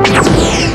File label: Andorian Gun; trek